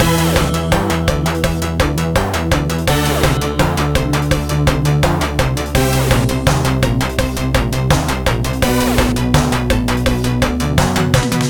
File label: BGM ramen